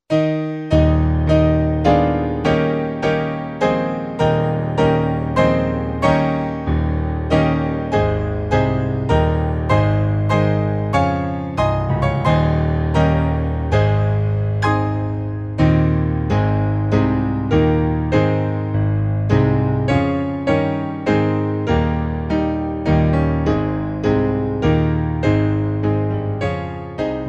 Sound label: Majestic Hymns, Piano Accompaniment